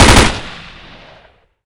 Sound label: m16 fire burst